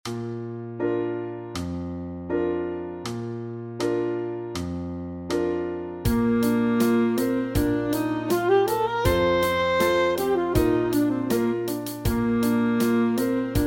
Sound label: Sax Study